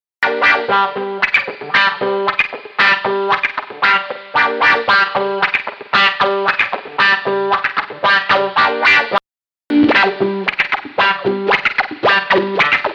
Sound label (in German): Wah Vergleich